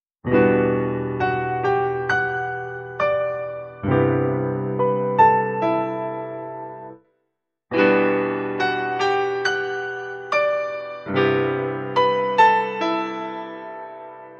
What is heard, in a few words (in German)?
Grand Piano ohne EQ mit EQ